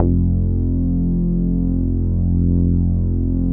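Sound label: ATTACK BASS F2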